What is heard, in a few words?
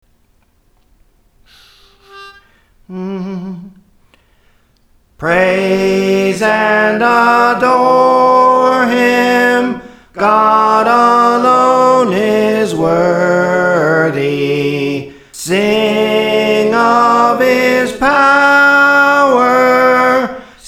Soprano, Praise For The Lord Training